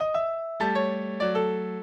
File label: minuet, mozart